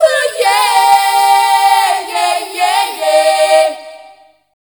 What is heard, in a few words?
FEMALE CHOIR, Dance Vocals; Best Service ProSamples vol.12; AKAI